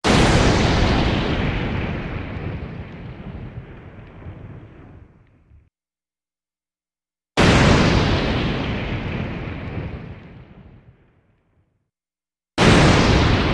Explosions, Processed Through Vocoder For Underwater Effect, 3x